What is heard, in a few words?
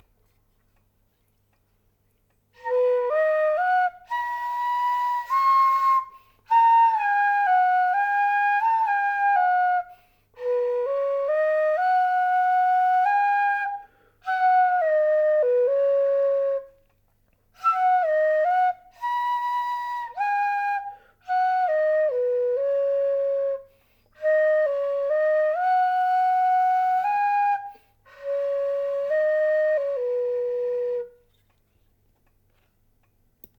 flute